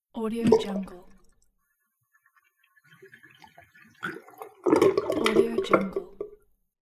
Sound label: bathroom sink drain